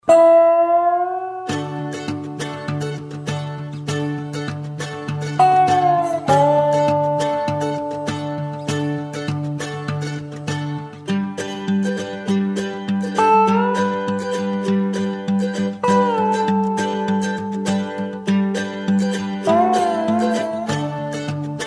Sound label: country track